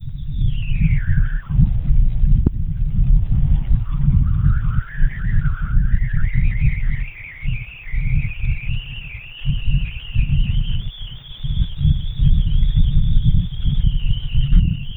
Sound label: palm denoised amplified, light to sound